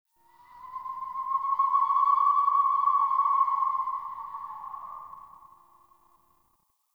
Signature Call; Corrupted Ode One

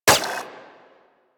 Braton Prime Redux Prime Layer, weapons; Warframe

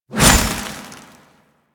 Hover Whizzby Angry; Warframe; Pax Duviricus

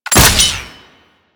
Ghoul Sawman Shot, Attacks; Warframe; Grineer Ghouls

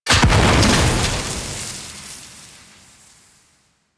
Barrel Gas Explosion; Warframe; Ambience; Corpus Gas City; Gameplay Remaster

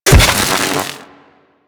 Electro Prod Hit Flesh; Warframe; Weapons

